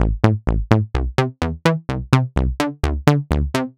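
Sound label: Synth bass, 127bpm, Nu Dance Electro; Combi Loops; Night In Heaven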